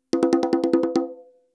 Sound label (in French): frise, congas